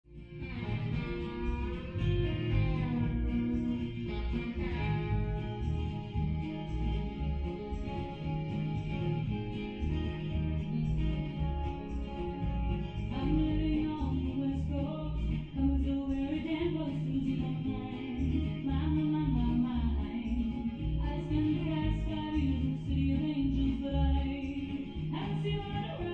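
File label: Fast Country Female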